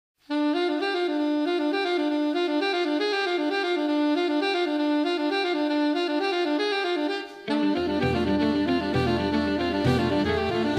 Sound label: Mode Plagal instrumental